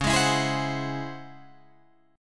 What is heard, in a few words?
Db Minor Major 11th, Guitar, Drop D; strummed